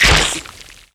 maggot shoot; pestilence

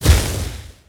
beast lava ball rise

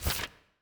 menu note hide

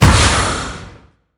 enemy appear wave

